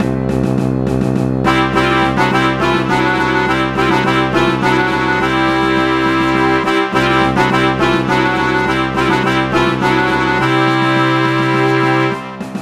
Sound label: Fanfare; loves labors lost music